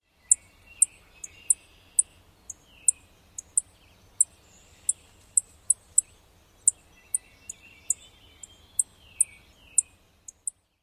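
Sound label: Field Sparrow chips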